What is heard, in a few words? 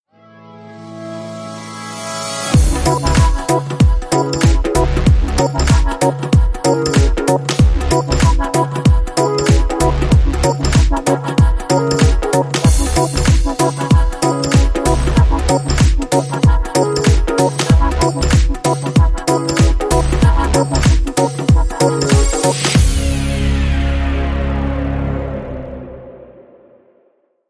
Tabla Timba Short Rhythm